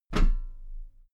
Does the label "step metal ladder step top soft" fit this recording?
Yes